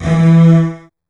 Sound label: DOUBLEBASS E3, Virtuoso Strings; ILIO; Kirk Hunter Virtuoso Strings VOL-2; 90 s Sample CDs; 5DOUBLEBASS